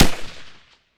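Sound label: percussion rifle, guns tuned; Roland - Rhythm Section; Guns & Glass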